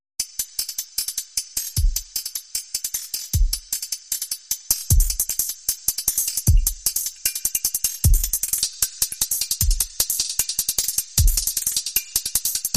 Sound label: Hihat